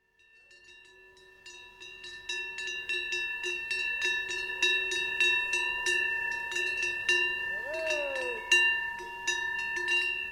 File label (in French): Cloches Vaches